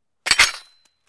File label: Metal Animal Traps